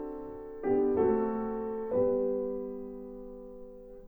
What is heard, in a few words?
piano